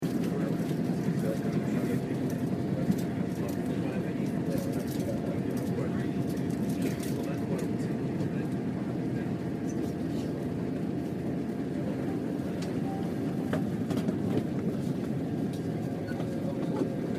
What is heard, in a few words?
Train To Penn Station; April 5th – LIRR to Penn Station – 6 pm